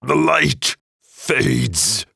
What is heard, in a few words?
Gerald death